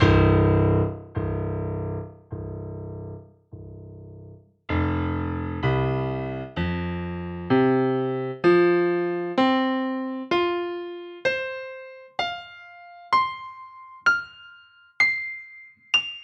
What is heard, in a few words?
Bechstein Recording Studio Close